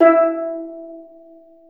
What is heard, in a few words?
E3 TENOR, STEEL DRUMS; AKAI S6000 CD-ROM; WorldSounds